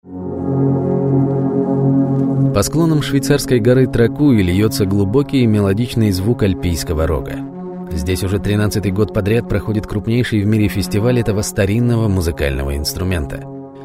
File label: ALPHORN